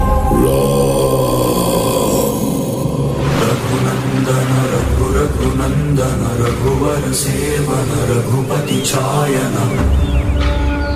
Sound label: Ram chanting Ringtone; Hanuman Movie